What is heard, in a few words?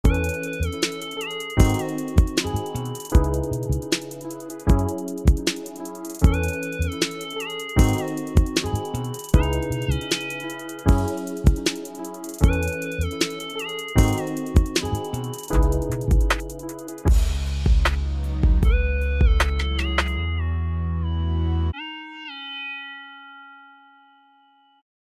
beat